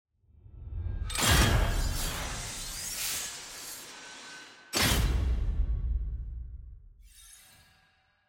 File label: level up; champion mastery